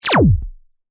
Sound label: Laser Gun Fire, Shot; Science, Fiction; Laser Sound Effects